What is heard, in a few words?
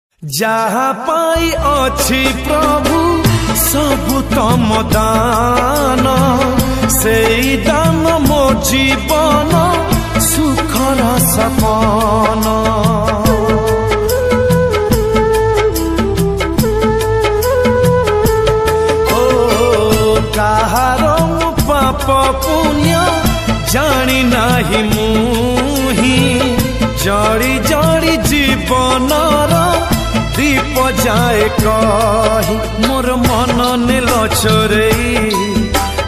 odia bhajan; jagannath